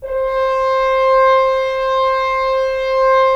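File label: Solo French Horn, brass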